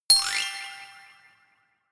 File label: notification glockenspiel sparkle slide up hard